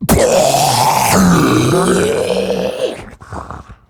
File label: Death, Dialog Barks Battle; Warframe; Grineer Ghoul Devourer